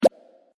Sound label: research Spawn